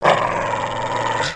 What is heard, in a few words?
Pet Terrier Growl; creatures pets